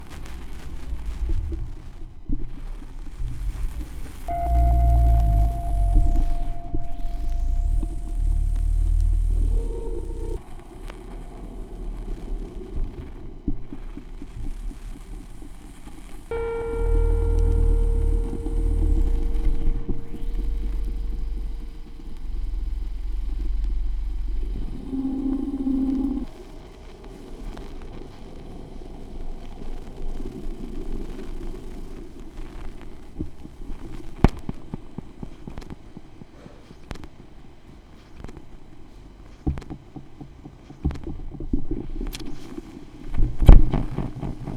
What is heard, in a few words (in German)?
schallplattengeraeusche, Tape Echo Mix